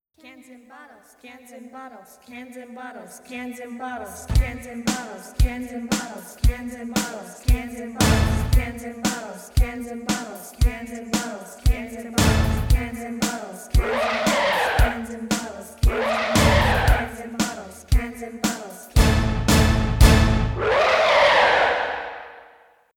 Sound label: Lets Chant